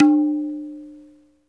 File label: INDIA PERC